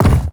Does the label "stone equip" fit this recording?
Yes